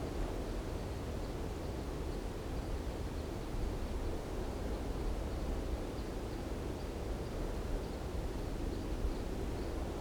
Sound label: Wind Light; zps; Ambient